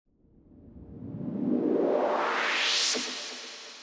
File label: Fx Spire Techno House